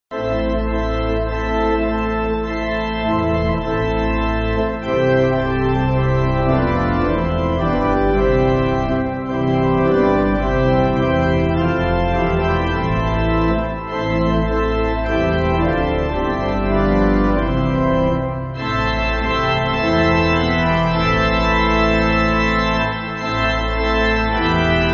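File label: Pipe Organ, Music Backing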